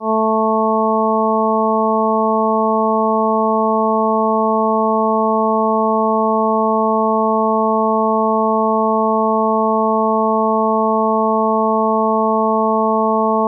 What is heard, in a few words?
partials